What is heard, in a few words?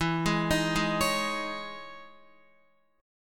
E Major 13th E, Guitar; EM13 arpeggiated; x,7,8,8,8,9; JGuitar